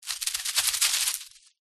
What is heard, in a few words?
animals bird turkey baby bush run away scared dried leaves